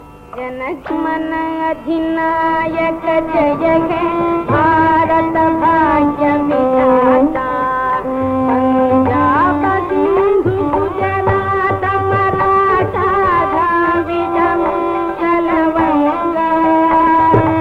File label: Jana Gana Mana National Anthem